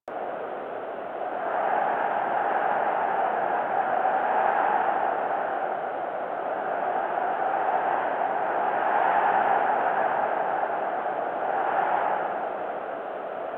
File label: Tower Wind Loop A; Warframe; Quests; TheNewWar; Ambience; Cetus; Unum Tower